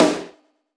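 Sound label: Room Snare; Roland BK-5; Snares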